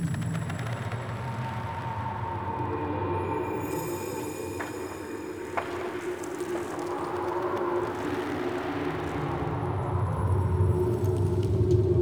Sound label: Under City Totem Ambience Loop, Dungeons; Beds